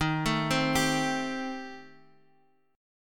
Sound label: D sharp 6th Flat 5th, D sharp, down, Guitar, Open F; arpeggiated; JGuitar